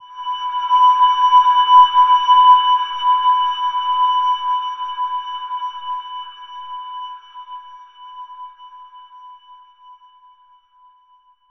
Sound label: Silver Gem B5 f; healing soundscapes